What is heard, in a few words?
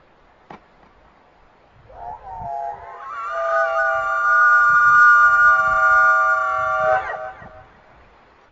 wendigo roar